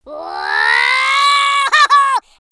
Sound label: bounce scream